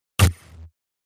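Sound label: Static Pulse HF Scrape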